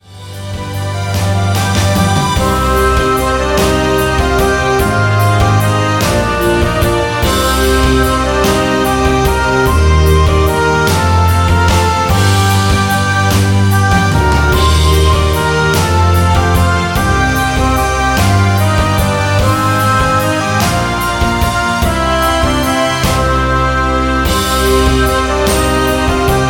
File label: lullaby